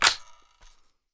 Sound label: g36c bolt catch; garrysmod